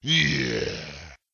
yeeeee

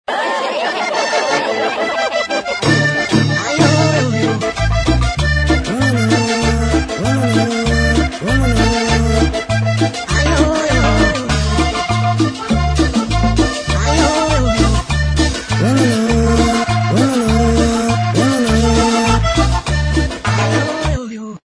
Cumbia Wololo

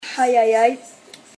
raieaieaie

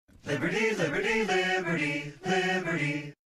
Tone; Liberty Mutual

